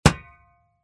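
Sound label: sounds of shovel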